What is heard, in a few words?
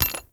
Flak Cannon Shell Impact; Warframe; Weapons; UT Weapons